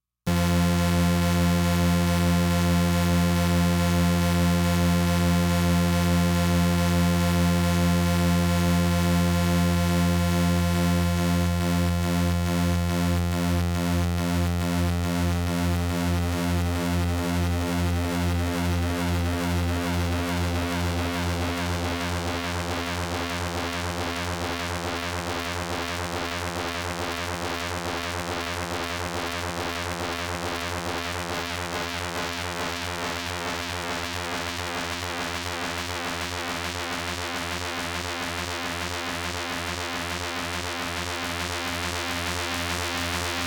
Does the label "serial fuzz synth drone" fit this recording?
Yes